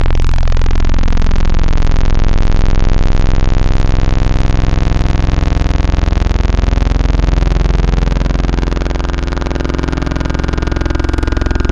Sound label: Saw Dual; Synthetic's Serum Collection; ESW Core Wavetables; Echo Sound Works Core Tables